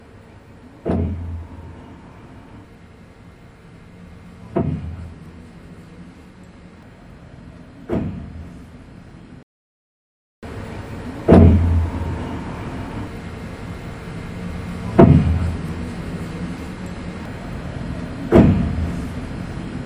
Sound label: waterhammer